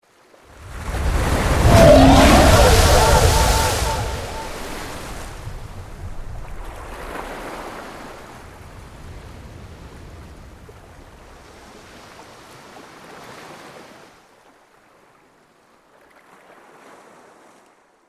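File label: Event Sea Monster Eats Frog; the unfinished swan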